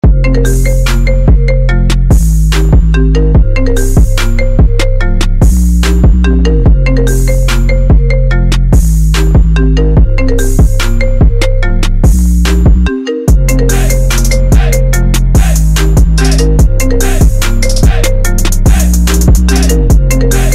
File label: iphone 12 trap remix, klingelton